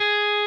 Arp.

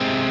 G#

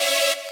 saw

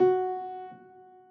Piano Long F#

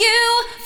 vocal

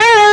bend full release; osu

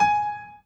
Piano Chord

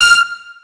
Last High F

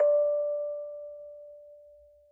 orgel

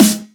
normal hit clap

